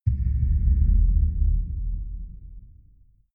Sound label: far outer space shadow, hits impacts